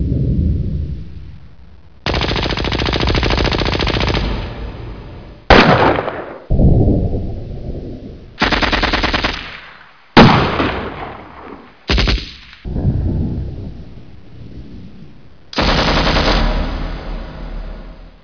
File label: shootout